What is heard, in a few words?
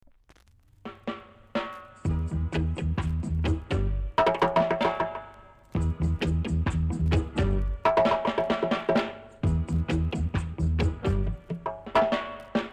AFRICAN DRUMS BONGO